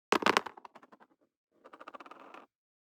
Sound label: DICE on Cardboard, Throw and Roll, Standard, Five Dice; Samples; ArticulatedSounds; DICE Sfx Party (Extended)